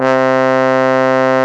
brass C3

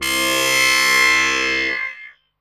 SFX, Drum & Bass, 160 BPM; Ueberschall; Drum & Bass Flash Trackz

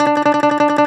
BALALAIKA, Prima Balalaika, Plucked Strings

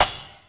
CRASH, Drums Dance & Groove

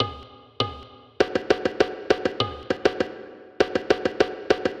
Masmoudi Tabla, Acoustic Beats; Sonic Foundry (Sony Creative Software); Myths of Technology

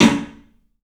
PABRSN, 3D Drums, CLUB X STICK; 3D Sound

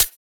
Closed Hihats; Vengeance - Essential Clubsounds Vol.5; Cymbals

